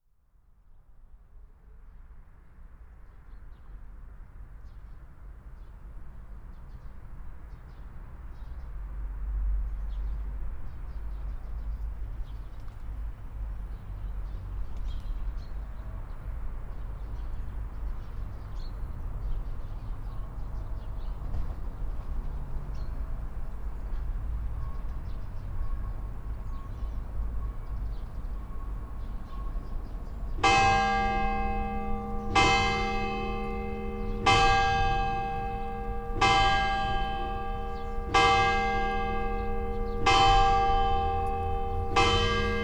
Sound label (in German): Glocken von Poppi